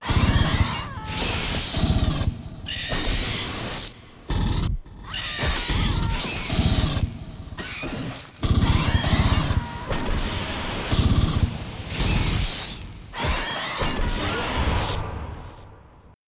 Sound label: open fighting